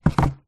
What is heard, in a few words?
foley pizza box set down wood table; zapsplat